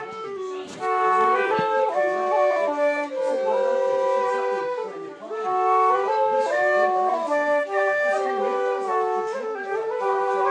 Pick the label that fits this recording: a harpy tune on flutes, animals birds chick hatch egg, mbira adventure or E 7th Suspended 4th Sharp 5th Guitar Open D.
a harpy tune on flutes